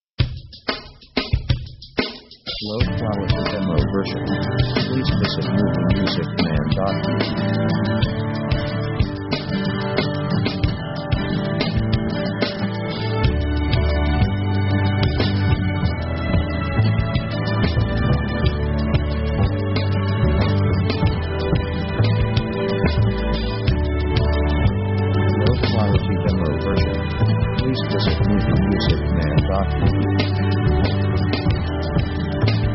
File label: SUMMERTIME JOY, easy